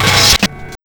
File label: FX GTR, GUITARS KIT